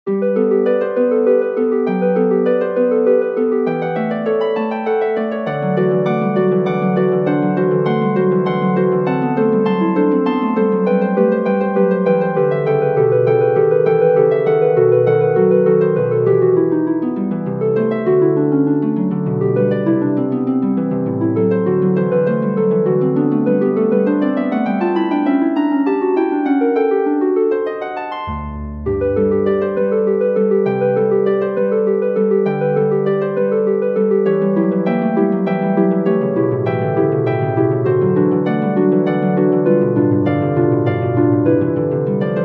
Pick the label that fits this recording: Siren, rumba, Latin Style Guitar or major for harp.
major for harp